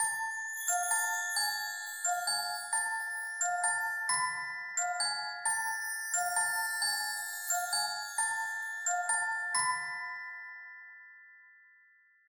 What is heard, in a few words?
Glock; Mummy Drugs Chase Light Rnd; tintin reporter cigars of the pharaoh